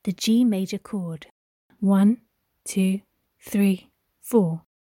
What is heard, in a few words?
org g major chord